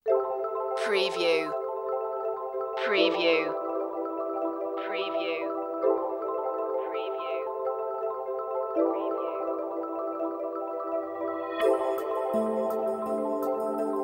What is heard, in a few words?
underwater adventure